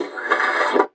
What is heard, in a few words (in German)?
PUSTE, bassoon; Xsample VOL-6